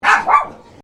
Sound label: Animal Dogs Chihuahuas Barking; Newcastle; Various domestic dogs; Dog Sound Effects; Dogs (Sound Effects:Animals); Foundation1